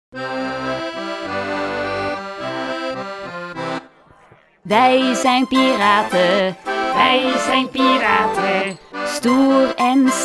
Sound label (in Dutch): begeleiding met zang; Wij zijn piraten; taalsprong